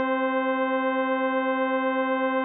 c1 c2 unrein

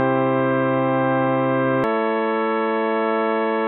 c cis gleichstufig

